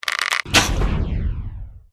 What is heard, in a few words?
cat attack voice